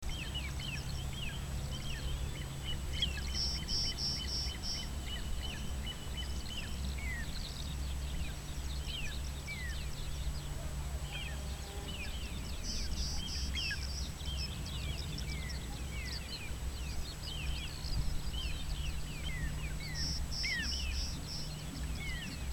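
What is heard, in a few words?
Emberiza (pallasi) lydiae song, background Ch dubius, Alauda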